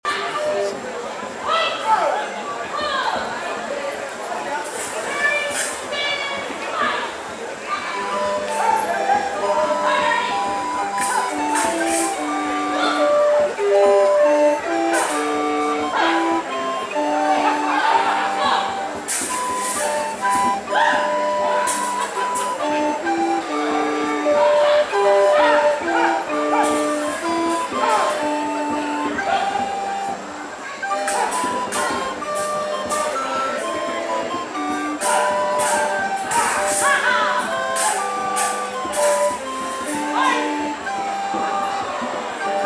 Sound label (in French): flutes a bec